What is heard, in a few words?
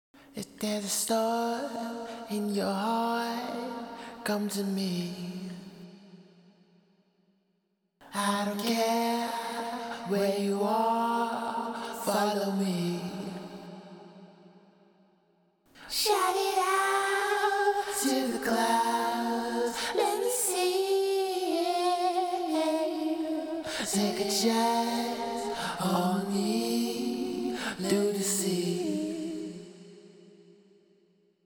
Vocal With Compression